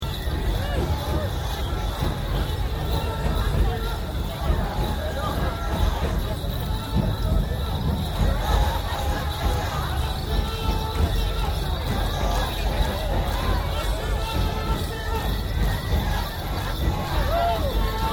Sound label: Festival Aomori Nebuta festival general ambience loop, Japan; Nebuta Fesitval in Aomori Japan; Concerts